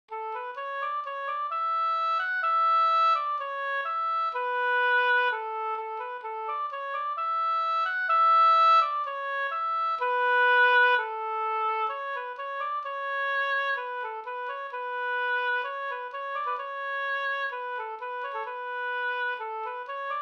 brudmarsch; fr Valdres

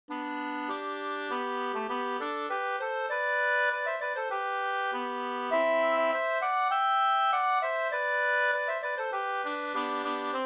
brudmarsch; fr Saltvik

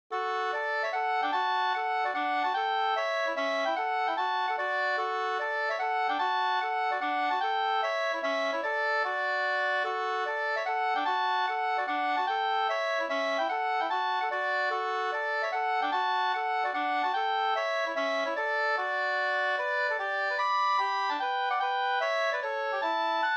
hambopolkett; Kajsas polska e Rickard Forsman Sd OA565 2st

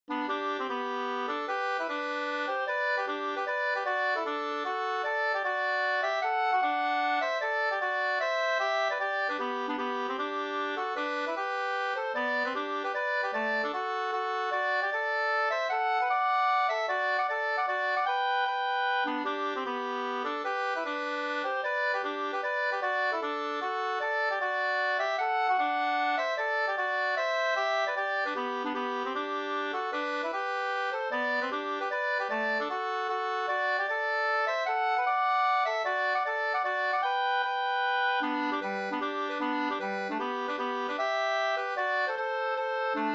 hambopolkett; e G Gustafsson Bjornlunda Sd 2st

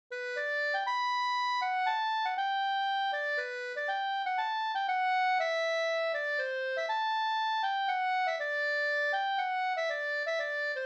polska Bodapolska; A herre Gud na je va brud e Rojas Erik

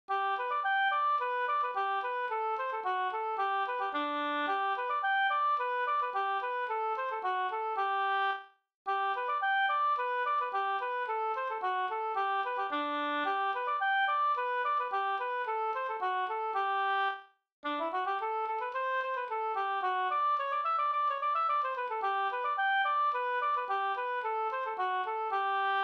folkdans kadrilj; Landskronavar

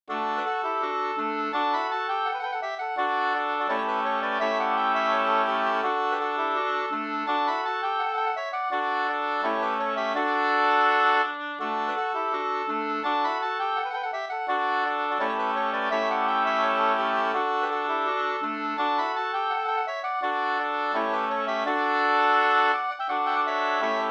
ganglat; fr Malung e Lejsme-Per nr1

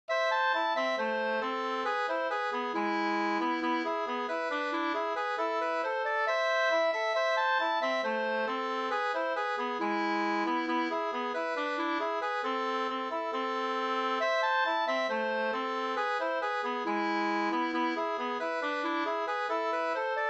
schottis jenkka; Talgoxen Titityy fi 2st

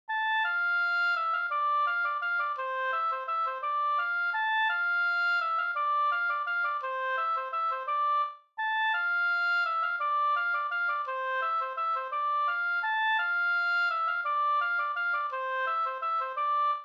vals Brudvals; e Arvid Brannlund Ragunda SvL

